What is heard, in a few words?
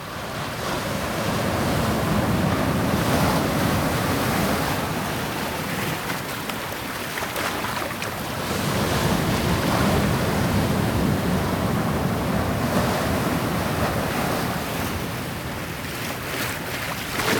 cliff; firefight